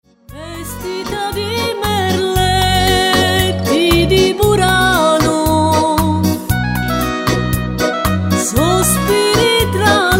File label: beguine